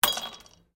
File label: Ice cubes Glass; Drink Mixing Sound Effects; Drink Mixing (Sound Effects:Bars)